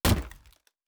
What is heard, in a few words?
Shield Wood